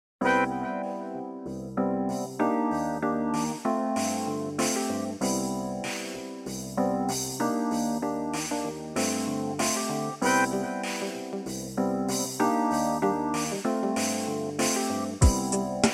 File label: hiphop